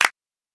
Claps

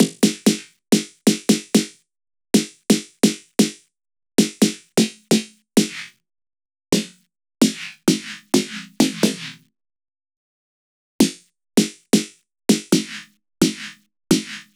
Snare Feedbacker 130

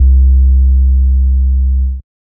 Bass Hit C

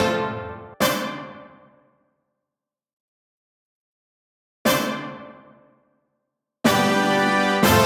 122 D Brass Line; Words Thrown

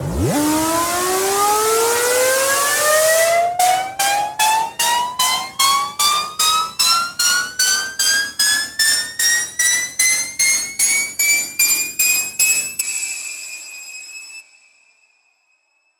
FX E 150bpm 8 Bars

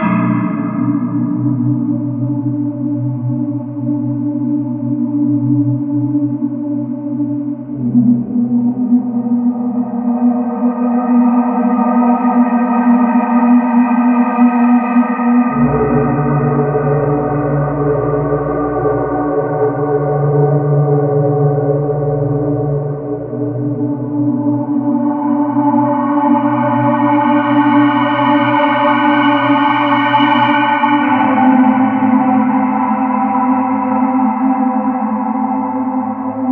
Atmosphere Am 124; Cinemax

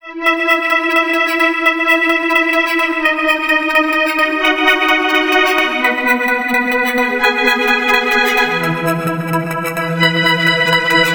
Pad Glitchy Dreams

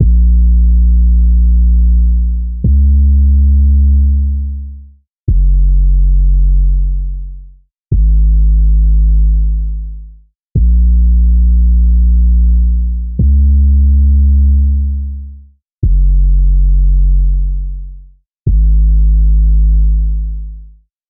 Bass Loop Am 91 dry

